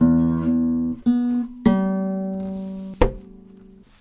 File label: plain guitar 3 notes and tap; GUITAR LOOPS